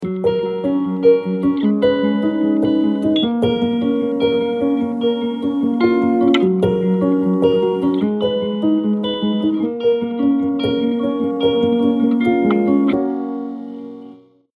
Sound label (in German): klingelton handy weihnachten